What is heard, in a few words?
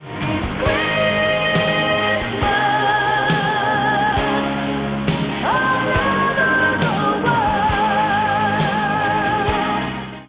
ringer; Ringtones